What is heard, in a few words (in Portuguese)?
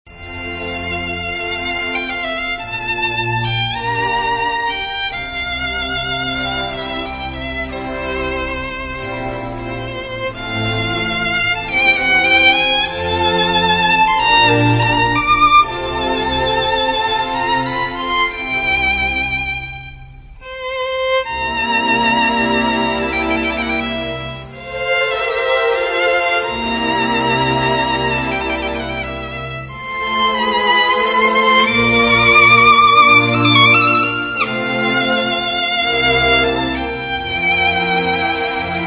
Orquestra Sinfonica violino